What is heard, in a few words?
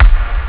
bdrum, Amiga Soundtracker; Amiga Soundtracker Sample Packs